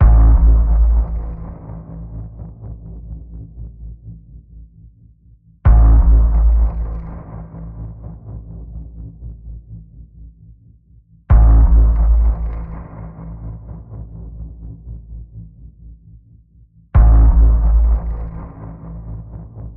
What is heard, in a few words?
Danger Pulse, Key of A